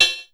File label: hat, drum; Masterbits - Total Zone